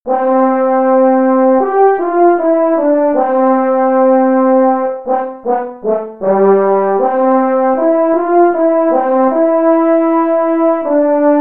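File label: horn slow; middle school; Region 23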